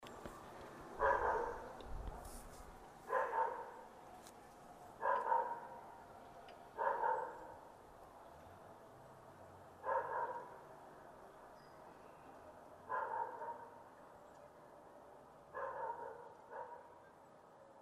barking dog

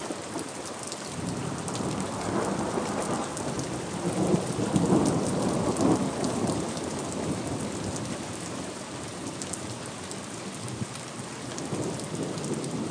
thunderstorm

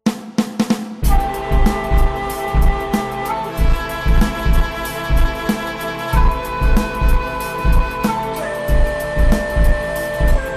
virtual shakuhachi; vst plugin software; syntheway strings

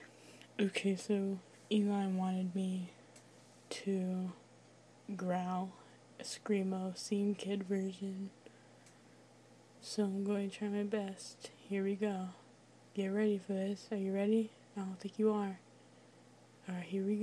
grrrrr

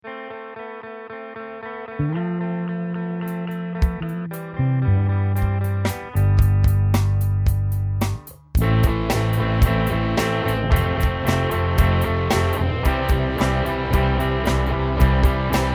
4/4 rock with fill

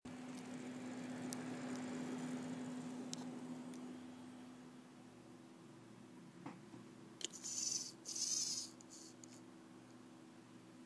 sound effects

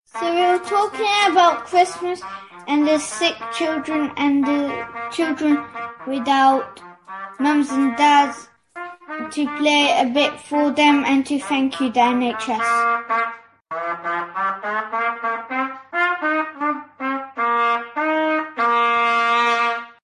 playing his trumpet; nhs

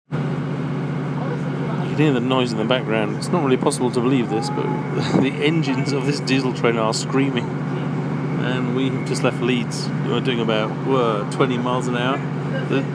wagons roll turbo boost out of leeds

